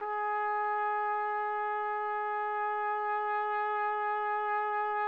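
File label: trumpet; Roland - LA Composer vol. 3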